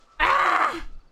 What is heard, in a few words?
Scream golf scream; IRyScream